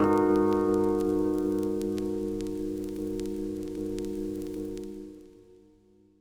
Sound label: crack E piano; smooth; eLAB